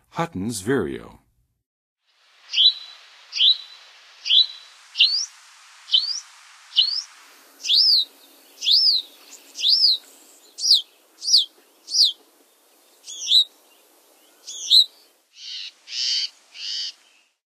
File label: Huttons Vireo, Songs of Birds of North America; Western USA; Western USA Songbirds